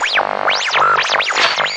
Electro FX; Ueb HE Electro FX

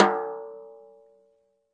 natural sd rim off, snare; single hits

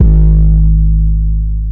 Clean 808 Long; drums single hits; Khezie 808s

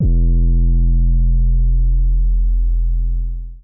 kick, drums single hits; iElectribe Kicks

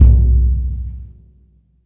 big burundi, African Burundi Drums

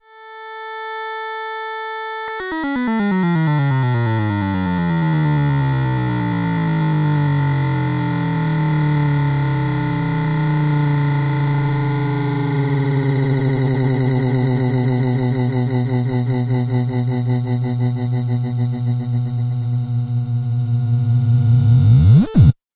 down n up, sweeps; Ze Franks Pain Pack; sweeps and fx

